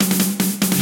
reel Spingle Start

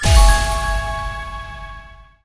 medium Win; parthenon

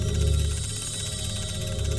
scatter Rotates; hiddencoinsofegypt

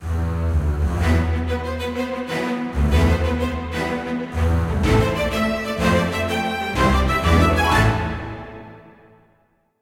free Spin Outro; robin hood

